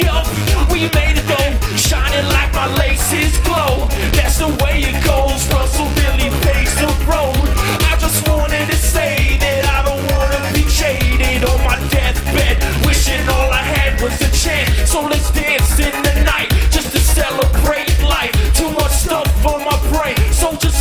Acid House

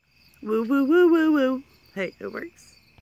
Woo

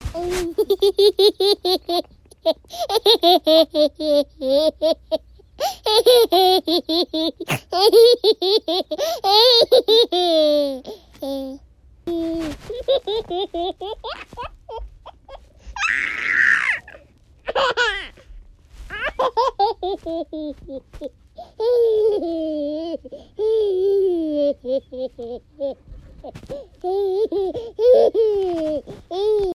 Laughing

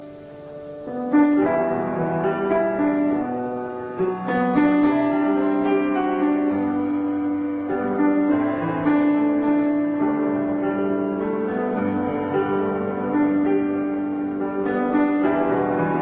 Piano

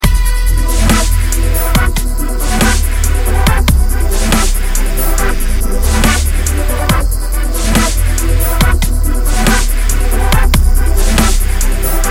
Chill Dubstep

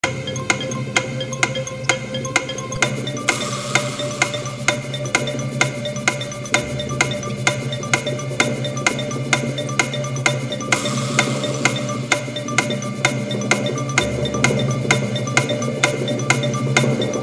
Tv Countdown Clock

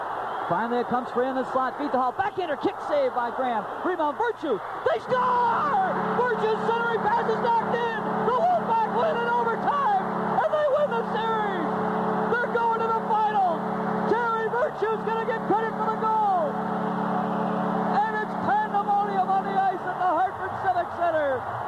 Goal

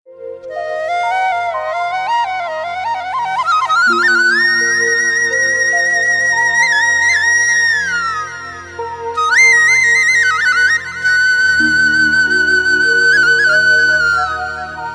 Classic Flute

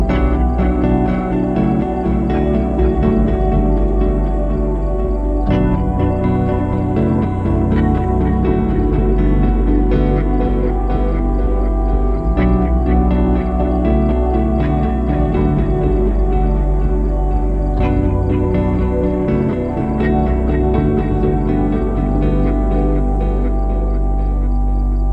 Smooth guitar tone

